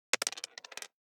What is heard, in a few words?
DICE on Plastic, Throw and Roll, Standard, 1 One Die; Samples; ArticulatedSounds; DICE Sfx Party (Extended)